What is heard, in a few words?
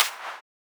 Clap, techno drum